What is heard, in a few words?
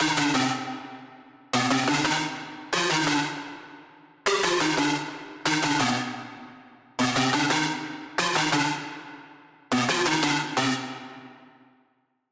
Pluck; Routed Sound; Sylenth1 Expansion - Chaos Bringer Vol.1